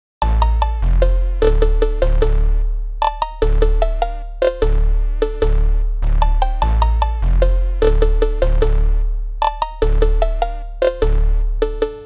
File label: PHONK